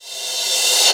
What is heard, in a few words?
Reverse Cymbal; Korg N1R